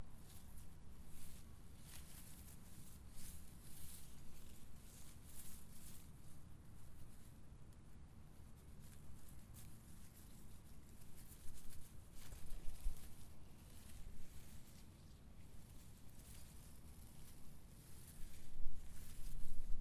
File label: Grass Rustling